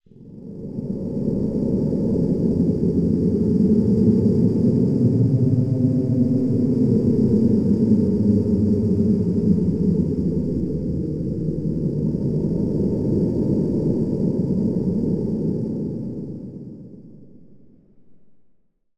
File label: Ghost Sirens and Monster Howls